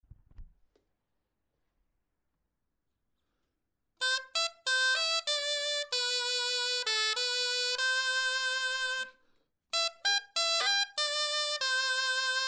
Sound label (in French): clarin etude